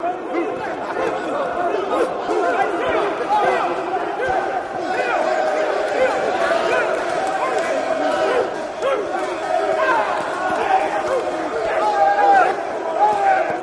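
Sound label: Prison Riot Interior Loop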